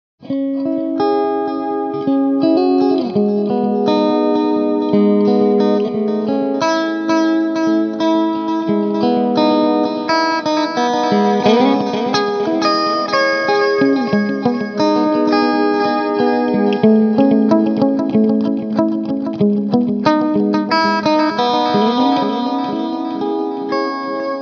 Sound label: Clean Strat Delay